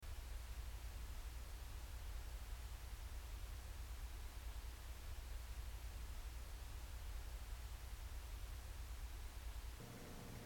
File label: freezer xtreme 5v 7v 9v 12v